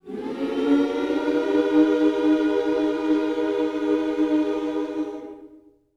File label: MINOR, Gospel